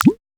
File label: Logo